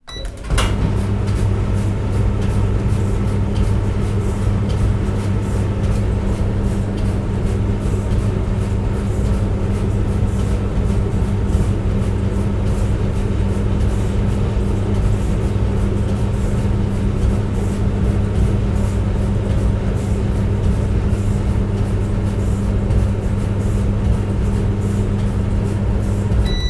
dryer machine on run off

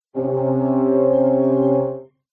MUSICAL ORCHESTRA Horns Chord Threatening; smartsound

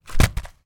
paper scrunched into ball drop or hit surface; foley; zapsplat

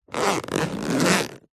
foley case small hard shell zipper slow; zapsplat

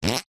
cartoon fart sudden rasp

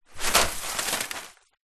foley paper sheets many throw at window

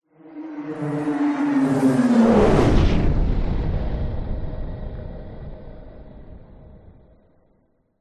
science fiction pass by whoosh into explosion; zapsplat

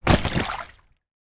inflatable lilo down on water; foley

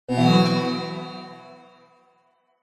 multimedia game sound swell action magical

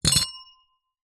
bell small reception desk bell drop carpet

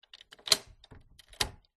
household front door heavy wood metal latch turn

